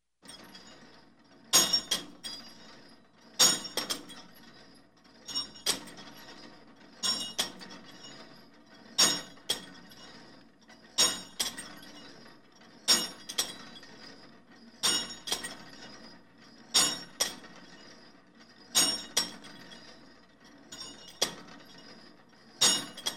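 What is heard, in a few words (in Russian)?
силовой тренажер лязг металла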